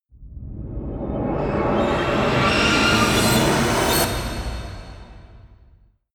rise squeak, risers

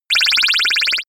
ringing small laser beam; krieg-waffen; soundpool

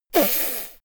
little girl snort; soundpool

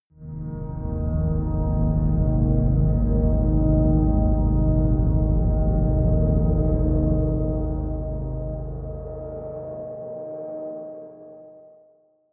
atmospheric drone pad deep; musikelemente; drones

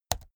keyboard laptop key press fast hard

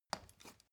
step ladder wood single

